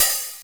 Open hat, retro house samples, Drum Hits

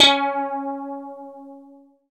GUI CLEAN, E-Guitar

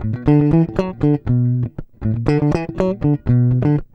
120 FUNKY, Acoustic Guitar Loops; Electric & Acoustic Guitar Loops; USB Soundscan vol.04; AKAI

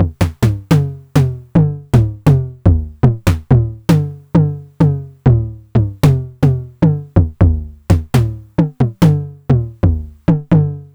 909 mod MT - MID TOM

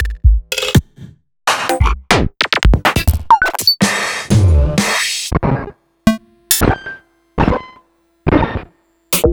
Percussions; Sliced

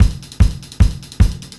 BEAT, Roots Disco; USB Soundscan vol.32; AKAI

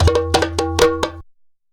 sabar groove; Best Service; World Colours

